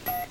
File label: HELMET SOUND, Science Fiction; Mower Man